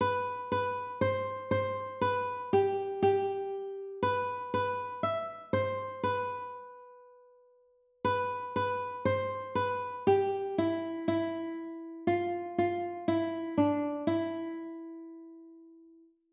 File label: phrygian